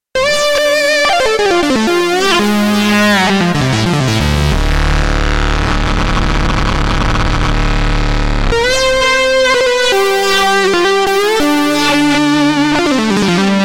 Monster Lead

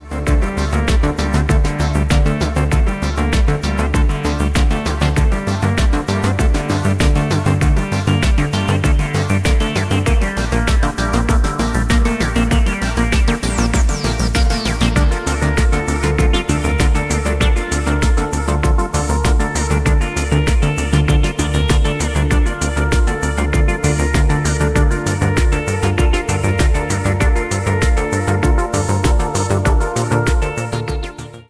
Psybient Track NO EQ

